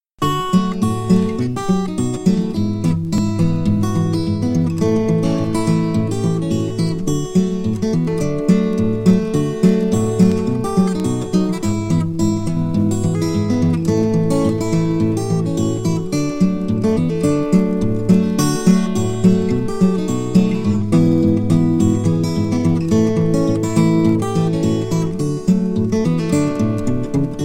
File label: ragtime guitar